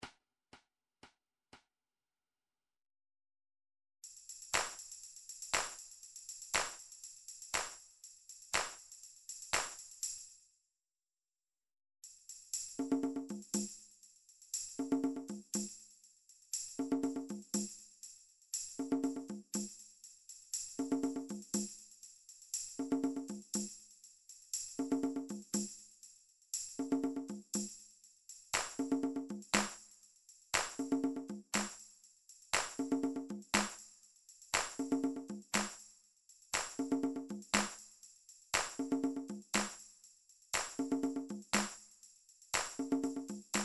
drum trax per

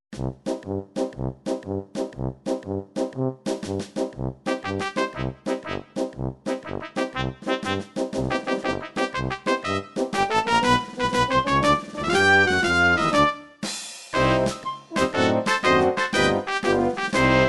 6 8 march

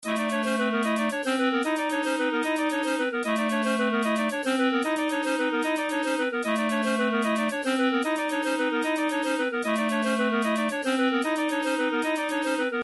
boss fight; Gameboy Super Mario Land Boss Fight (2)

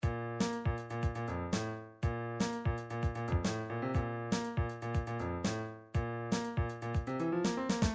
midi drums

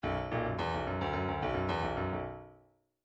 bdf midi file grooves funk d funk d

